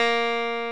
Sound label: Electric Pianos; OMI - Universe of Sounds; EII Factory Library